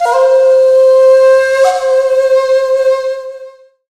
Dance Synths; Best Service ProSamples vol.37; Ethnic Synths

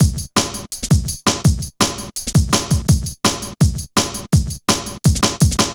Hard Stepz B, Drum Loops 166.5; Zero-G Jungle Warfare 1, 2 & 3

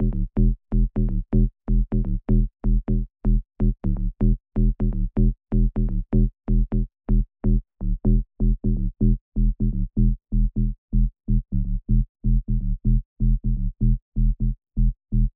125BPM DRIVE BASS E

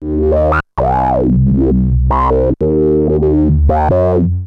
VOCODIZER; SFX; Syntec; Wall of Sounds; TECHNO-BOX

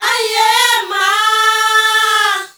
ZULU CHR, FEM. CHOIR

